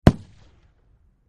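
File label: Hk21 Machine Gun Single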